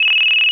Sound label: Electronic Cell Phone Ring High Pitch